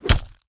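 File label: crowbar hit body, weapons; valve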